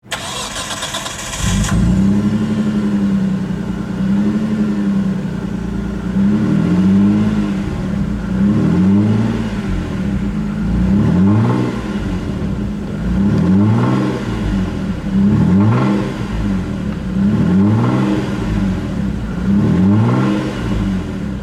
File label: Skyline GTS R32 RB25DE